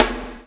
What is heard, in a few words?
CHAIN; Spectrasonics - Backbeat